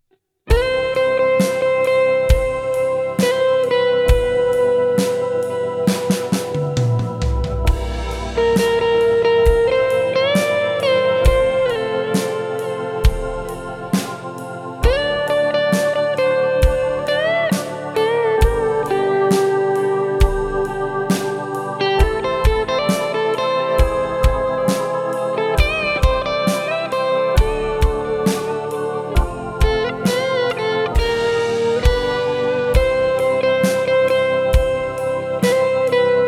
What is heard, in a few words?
Backing Track